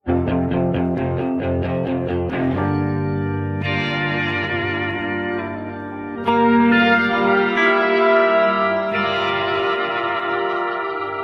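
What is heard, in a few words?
second guitar solo extraction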